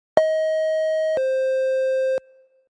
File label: house tones; active911